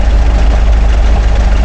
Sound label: airflow; Mafie 1